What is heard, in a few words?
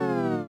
incorrect